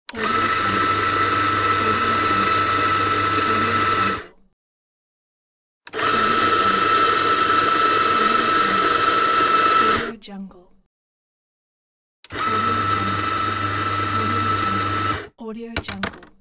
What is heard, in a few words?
coffee grinder; spillaudio